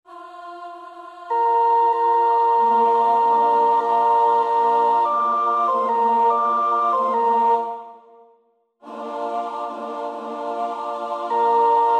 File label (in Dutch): sopraan 2, koorpartij oefening; Willan (Healey)